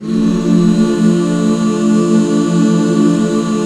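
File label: HAL D minor left, Back Chords; Sound & Vision - Gigapack II CD 1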